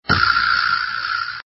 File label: Vibraslap